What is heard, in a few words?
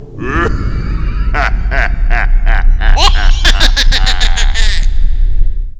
sinister; quake2; ctf